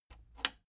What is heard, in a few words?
plastic button latch scratch disable slow